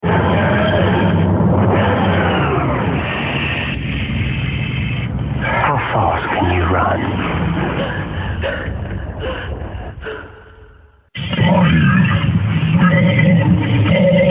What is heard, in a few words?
Message Tone